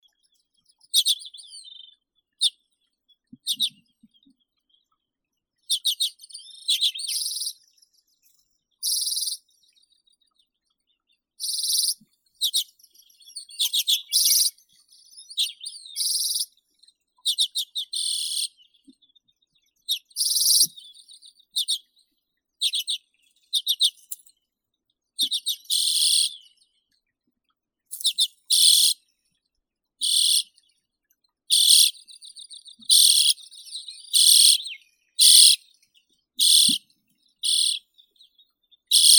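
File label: Arctic redpoll, Acanthis hornemanni; chechetka pepelnaya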